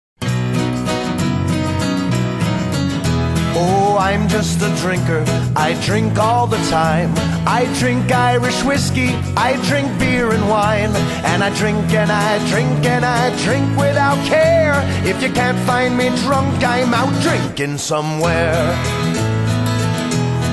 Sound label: Drinking Song Sample